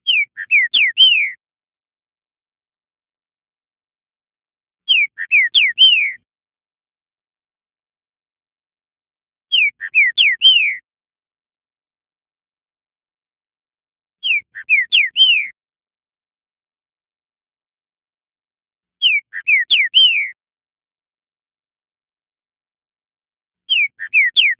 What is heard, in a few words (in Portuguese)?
Canto Rebicador